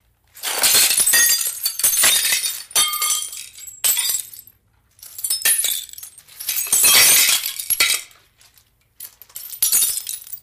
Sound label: Glass Debris Fallin; shards